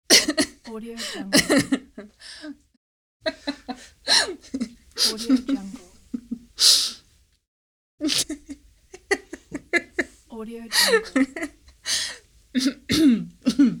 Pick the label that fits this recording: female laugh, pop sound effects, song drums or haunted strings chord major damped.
female laugh